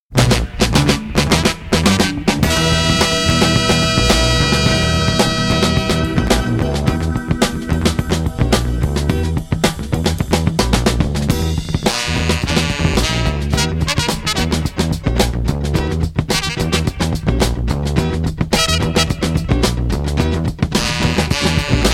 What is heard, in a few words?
funky Korean soul